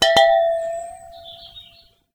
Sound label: Water Clink, Glass Clink In Water